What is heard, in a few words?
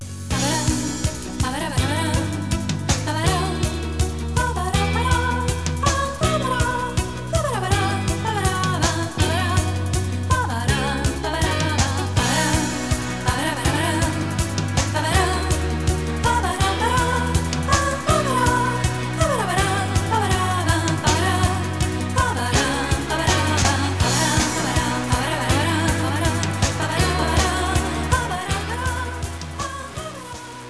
kolibri vocalis